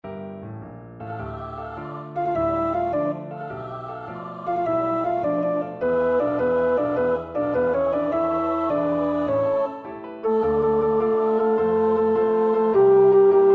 Of de groote mast, tenor; 6b.Of de groote mast; Wagenaar (Johan); De Schipbreuk

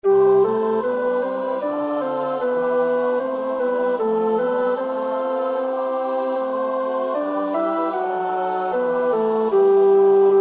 tenor, koorpartij oefening; Bach (Johann Sebastiaan); BWV 149

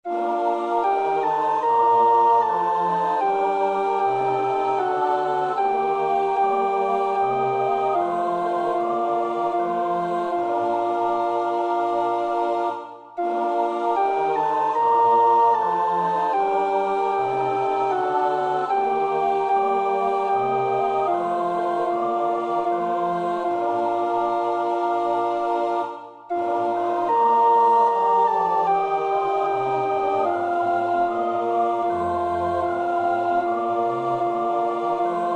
alt, koorpartij oefening; Bach (Johann Sebastiaan); BWV 164; Ertöt uns durch dein Güte

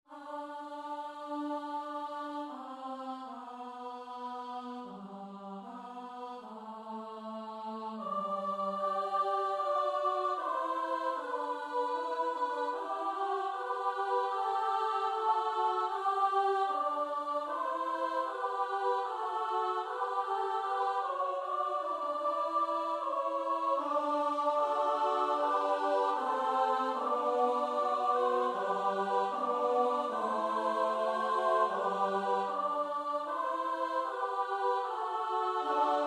S-A-T-B, koorpartij oefening; Hassler (Hans Leo); Missa Secunda

